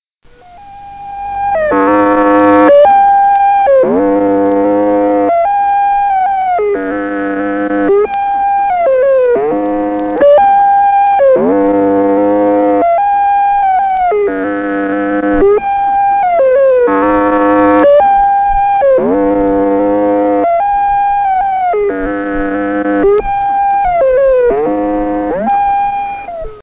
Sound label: moonquake